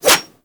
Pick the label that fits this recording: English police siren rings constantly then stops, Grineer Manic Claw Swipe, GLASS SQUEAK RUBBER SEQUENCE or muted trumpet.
Grineer Manic Claw Swipe